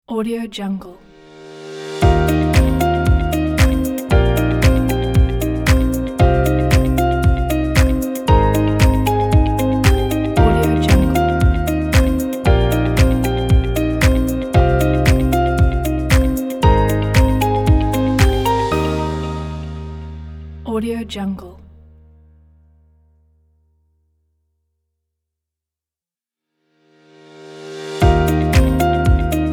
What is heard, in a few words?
inspirational corporate logo